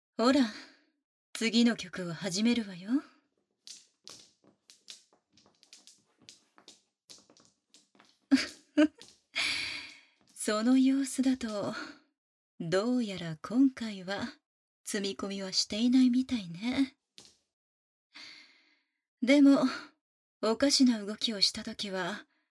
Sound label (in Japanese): ぐっぽり耳の奥まで耳舐め